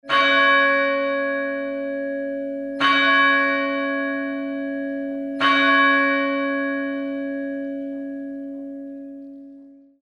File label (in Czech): zvon apolena; zvonohra